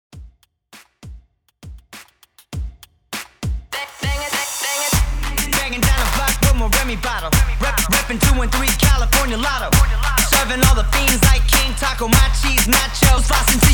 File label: TWERK 100 BPM